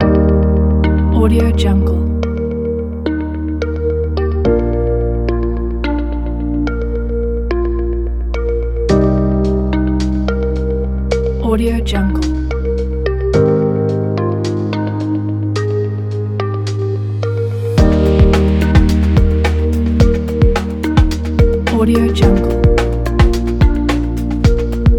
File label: ambient motivational corporate